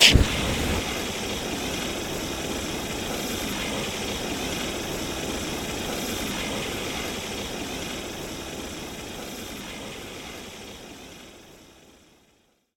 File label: flame thrower pilot, weapons; tf2